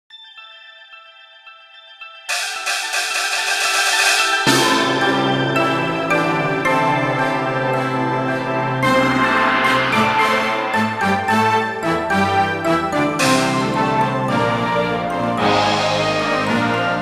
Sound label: Music China